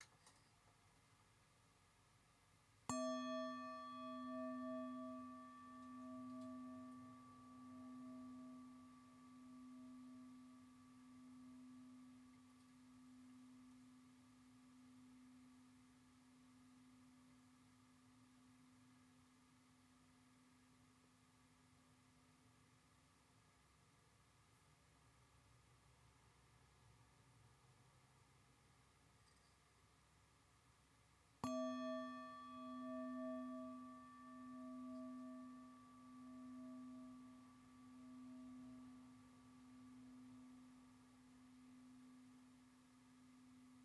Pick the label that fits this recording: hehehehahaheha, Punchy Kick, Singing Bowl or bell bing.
Singing Bowl